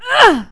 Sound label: pain